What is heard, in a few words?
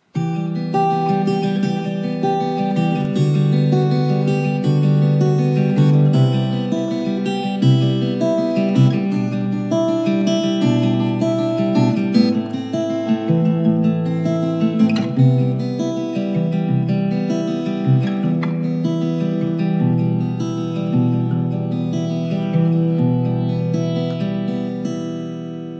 guitar recording